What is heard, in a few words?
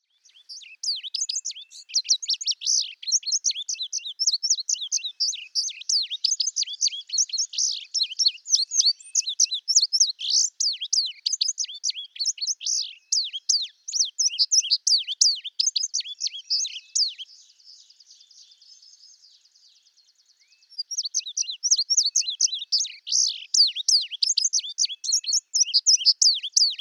Indigo Bunting